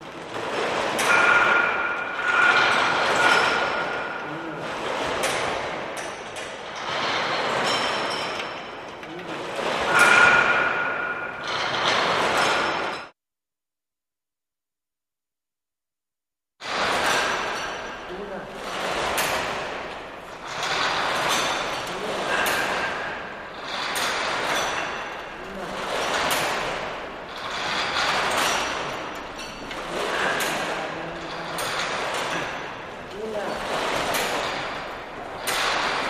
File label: Buta Femero Incense, Buta Femero Incense Burner Swinging; pulley